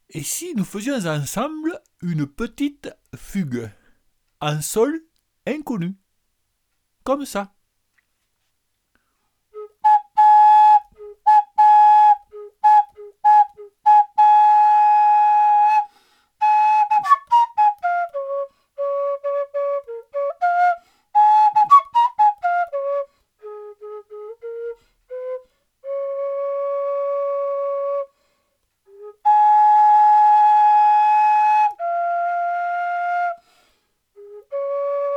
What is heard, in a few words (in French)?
fugue en sol